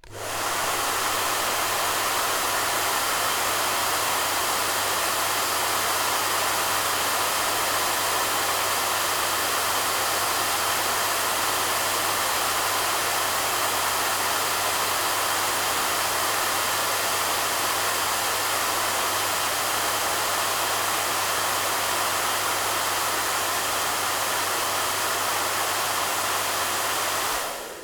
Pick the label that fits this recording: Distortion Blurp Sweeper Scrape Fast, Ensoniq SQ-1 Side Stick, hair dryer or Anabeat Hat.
hair dryer